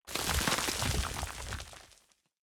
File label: rock debris; CrossCode; battle